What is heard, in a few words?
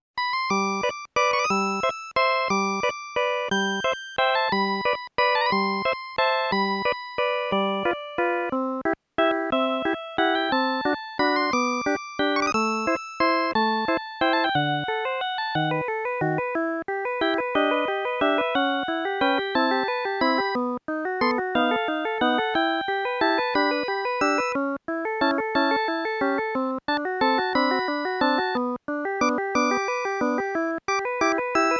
Folk Dance; dragon quest game music super collection vol.1